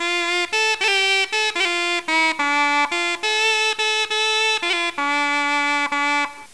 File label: Pipe; Falster